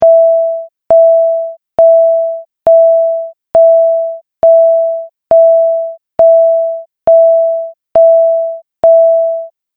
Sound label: Warning Beeps Medium Speed; Beep Sound Effects